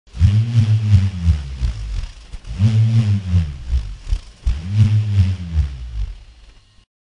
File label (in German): SCHWIRRH; Luftorchester